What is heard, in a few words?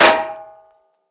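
metallic heavy monotone clang; metallicheskij uvesistij odnotonnij zvon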